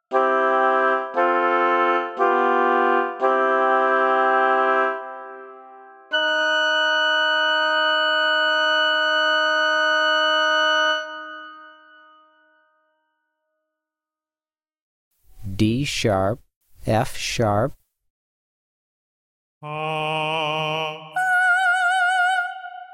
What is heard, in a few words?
D#3 F#5 Oboe 2 Note Singing; Level One “Singing” Example; ear training two note